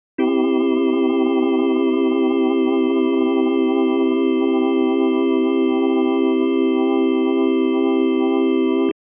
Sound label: long chord leslie fast to slow, hammond